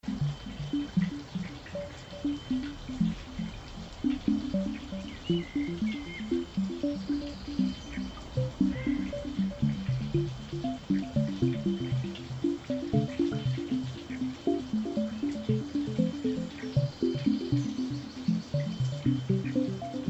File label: Fairy Rainforest, 6144Hz